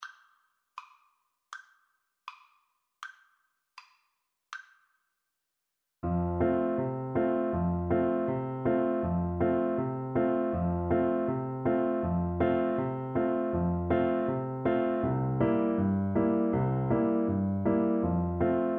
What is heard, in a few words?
coming round the mountain, Bassoon